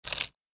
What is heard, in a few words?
Eating food